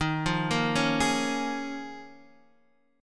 Eb 7th Suspended 2nd Suspended 4th Eb, Guitar DADGAD